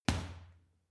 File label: boxing punching bag uppercut medium